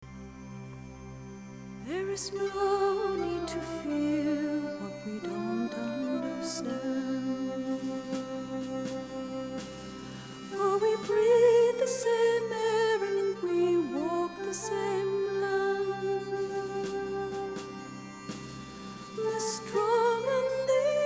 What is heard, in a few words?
Flute Outro